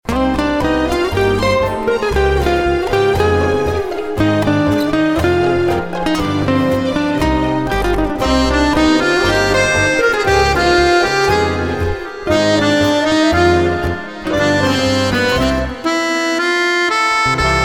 tango musette

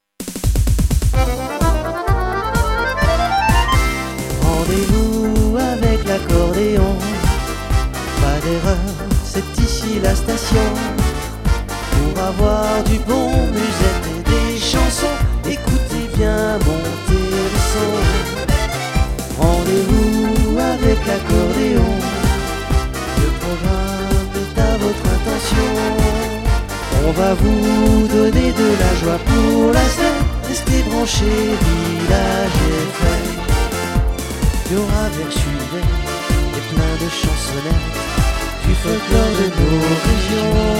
accordeon

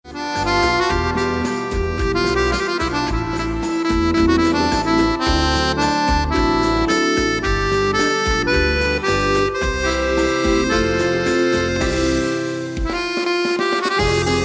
accordeon bolerito

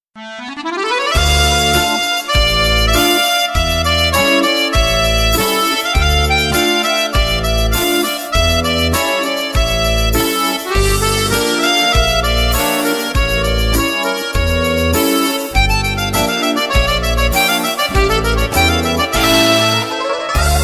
accordeon

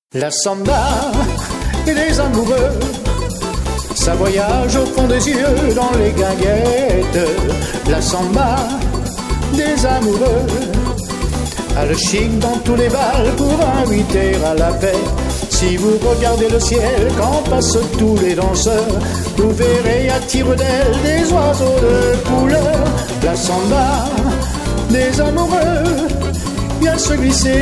samba

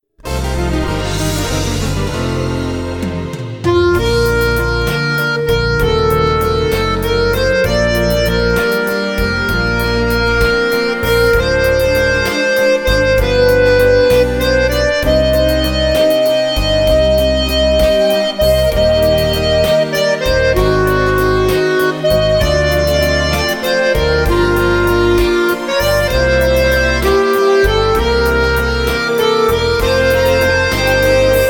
trompette

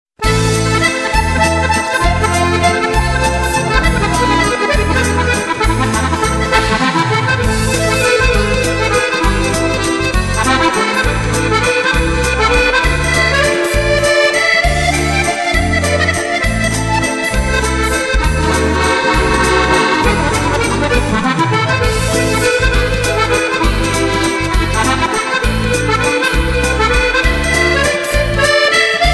musette

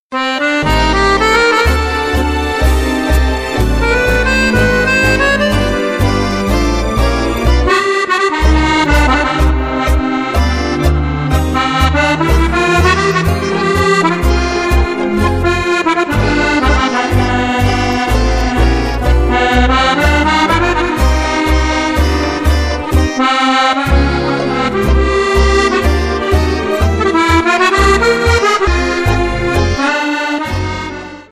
tango

